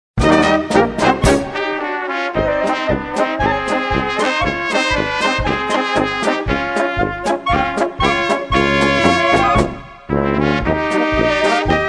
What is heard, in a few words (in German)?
Polka